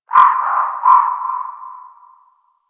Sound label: Bird Distant; Warframe; Ambience; Eidolon Plains; One Offs